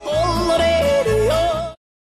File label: jodel